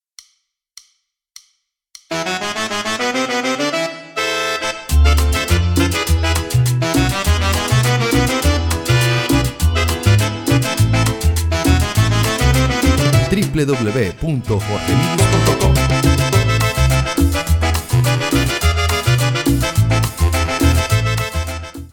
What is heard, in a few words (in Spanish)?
cumbia